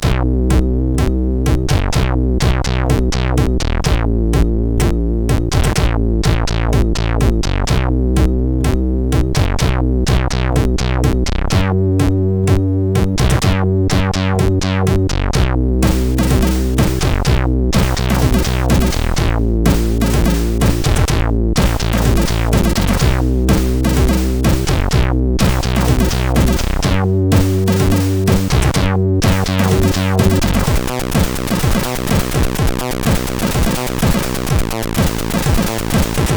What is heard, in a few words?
Two Track Drum; hvsc